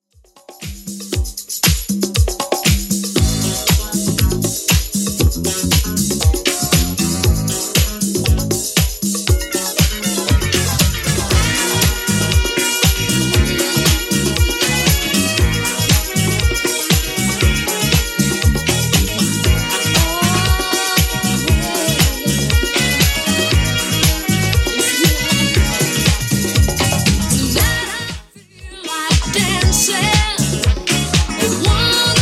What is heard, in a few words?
70s Redrum 118bpm